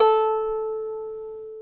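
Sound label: harp A3, Elizabethan harp; InVision Interactive; Lightware VOL-1; Instruments & Percussions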